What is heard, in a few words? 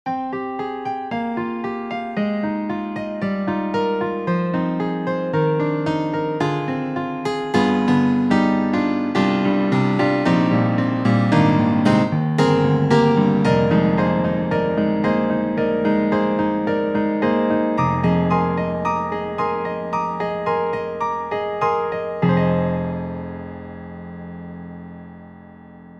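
Roland Juno-Gi Stage Piano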